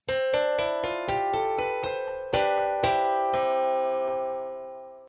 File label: Dolceola